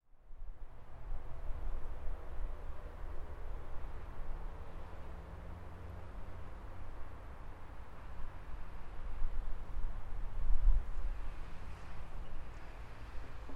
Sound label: stadthafen tram station; m petridamm